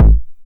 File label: steel kick drum g sharp key, Royality free kick tuned to the G# note. Loudest frequency: 101Hz